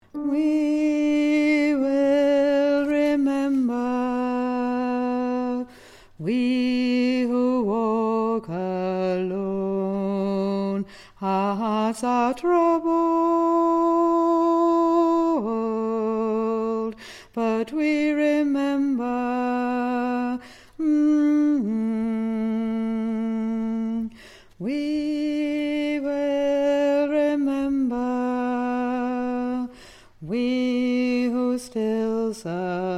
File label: we will remember Alto